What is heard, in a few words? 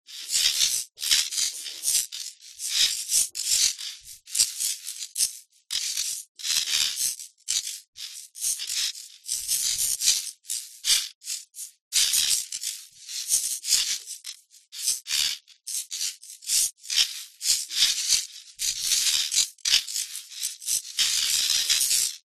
chaotic electric sizzle loop